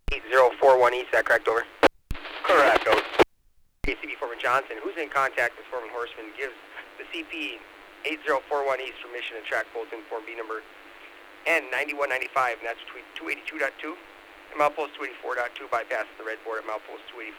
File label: Slow Order